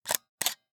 UI, camera mechanics, servo, clicks clacks, meaty, operative, forward; Start Select